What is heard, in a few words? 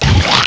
krunch